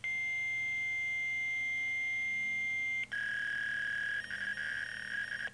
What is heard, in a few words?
fax; residential; zones